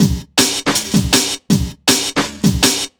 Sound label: Beat, Drum N Bass; Sonic Foundry (Sony Creative Software); Knutrix Drum & Bass